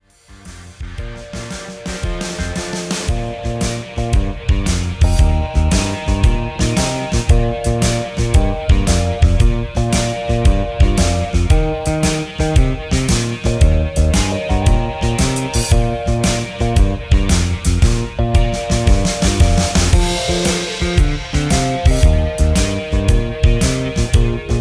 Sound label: Straight Ahead Blues Bb